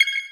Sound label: Space Click